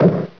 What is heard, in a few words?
thump